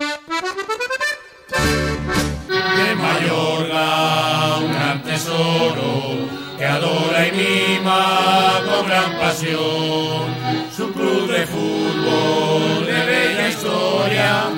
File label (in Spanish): Himno